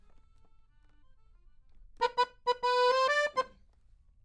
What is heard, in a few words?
Accordion; Jazz